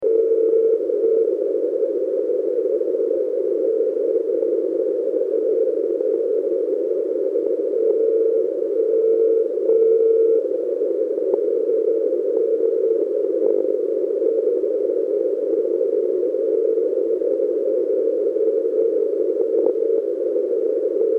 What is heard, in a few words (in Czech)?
majak; SuperQRPtest; Majak4; Jarda OK1HDU